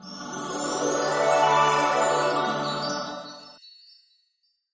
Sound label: sun disk appear; games; Aztec Gold Megaways